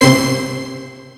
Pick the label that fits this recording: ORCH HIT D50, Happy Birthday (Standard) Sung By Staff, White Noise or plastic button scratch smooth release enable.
ORCH HIT D50